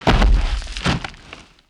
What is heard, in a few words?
Hit Effect Concrete; 1944 Battle Of The Bulge